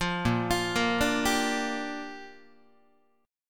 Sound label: A sharp 6th, guitar chord; arpeggiated; JGuitar